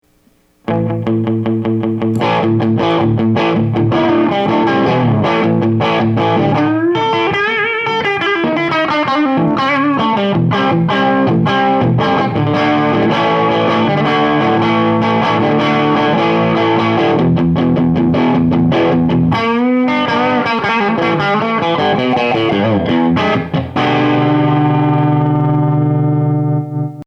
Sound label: fuz low tight